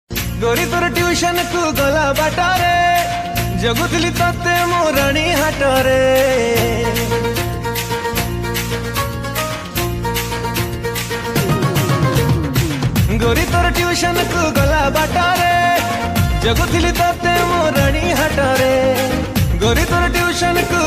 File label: Odia Song